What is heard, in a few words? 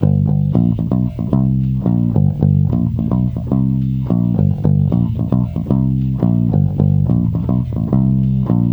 Blues Bass Energetic G 110